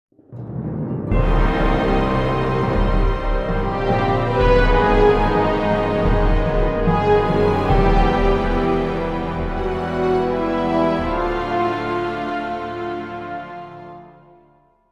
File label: Jingle Negative